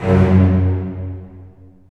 STR, Roland; Roland - LA Composer vol. 4; Full Short

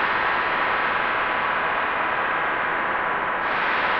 sparse soundscape samples; ReLooped

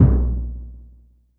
Surdo Open, Standard Kit, Yamaha PSR-E323

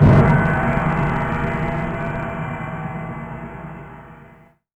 crash, Drum Machines, Korg ER-1; 300 Drum Machines; Kit 15

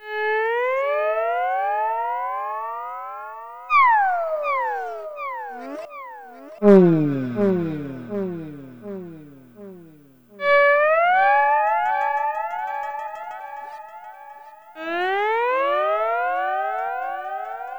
Gtr Fx-G#; Lounge; Zero-G Kocktail Kollection; Construction Kitz; Sunrise Mixes 082; 90 s Sample CDs

